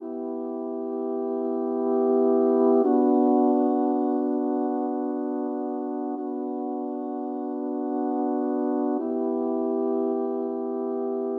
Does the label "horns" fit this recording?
Yes